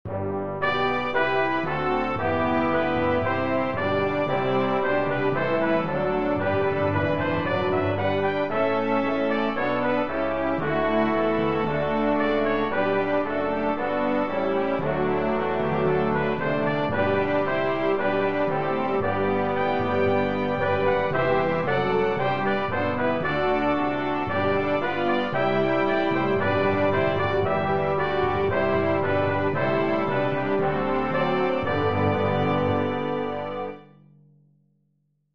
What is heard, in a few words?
Hymn